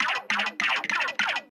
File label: wide shot fire loop; Cuphead